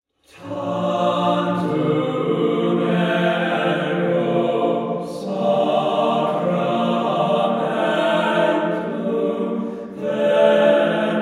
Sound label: all 3 voices; CHABANEL PSALM TOME